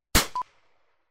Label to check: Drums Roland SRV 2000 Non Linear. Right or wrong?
Wrong